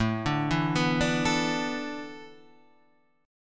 A Suspended 2nd Suspended 4th; Asus2sus4 Chord {5 5 2 4 3 5} arpeggiated

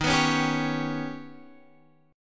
D sharp Augmented 7th D sharp

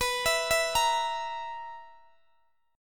B Major 7th Suspended 4th B down Guitar Open D

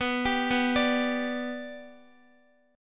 B-Minor Sharp 5th, Guitar; arpeggiated

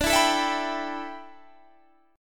A 9th Suspended 4th, D

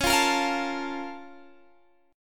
Bb Minor Major 7th

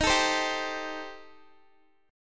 D sharp 6th Flat 5th, D sharp, down, Guitar; D#6b5; strummed

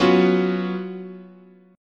F sharp major 7th suspended 4th, chord

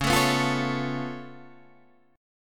Db Augmented 7th, Guitar Standard, Db+7 chord; strummed; JGuitar

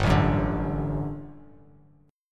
G Major 7th Suspended 2nd, Bass Standard; GM7sus2 strummed; 3,0,4,7

